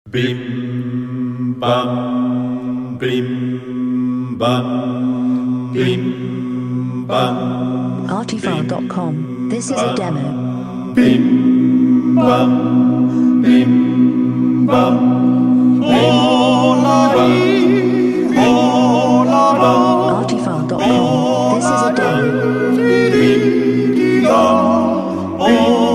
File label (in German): glockenjodler